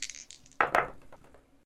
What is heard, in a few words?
dice